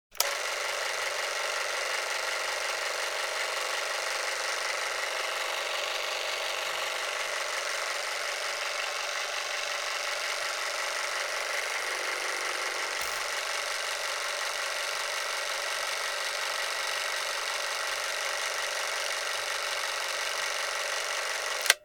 Caméra super 8; bruitage; Electro-menager

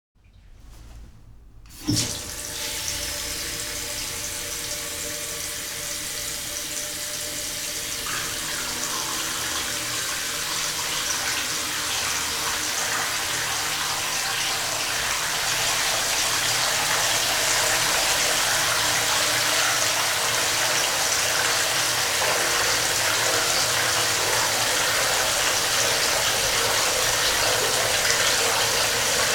Remplir une baignoire d'eau, bruitage, Liquides

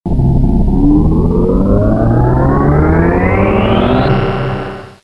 Démarrage moteur vaisseau

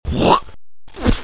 Cracher; bruitage; Liquides

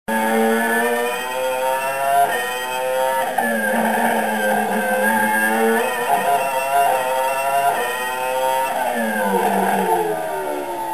Formule 1

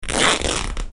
Pantalon qui se dechire

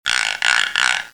Crécelle

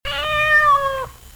Chat, Animaux; bruitage

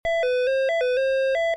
Téléphone

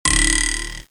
Sifflet tremblotte

